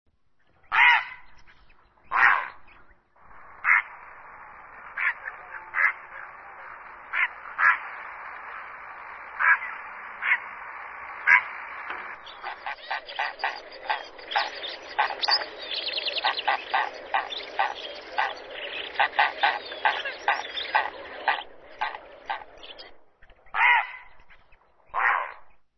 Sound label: Grey Heron